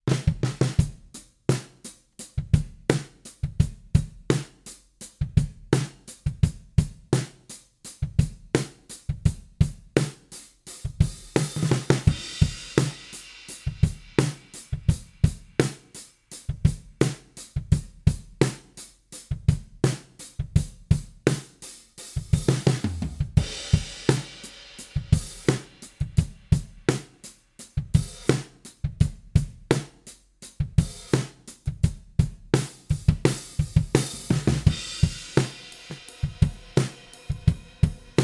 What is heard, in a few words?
all drums mics